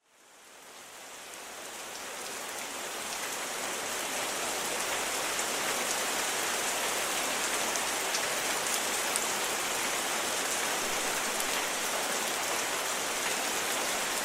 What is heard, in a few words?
Rain storm Thunder sound